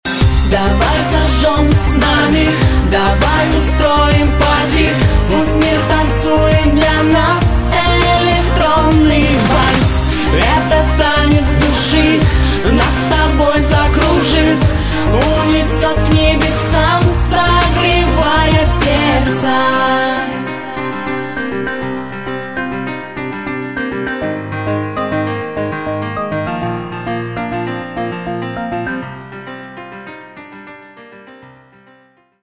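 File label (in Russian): электронный вальс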